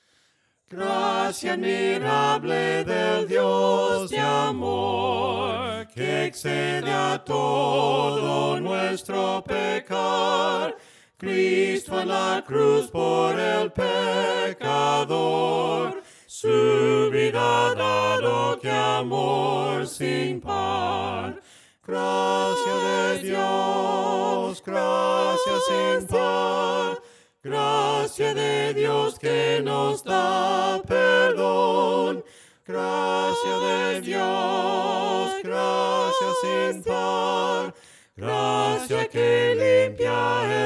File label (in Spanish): VOCES, himnos a capella; cantos del camino; GRACIA ADMIRABLE